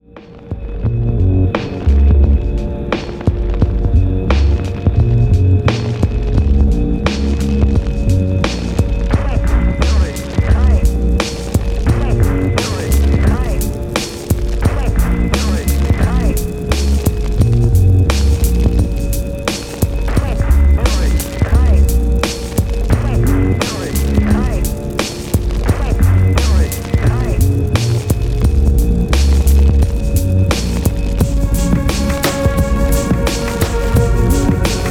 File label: Gong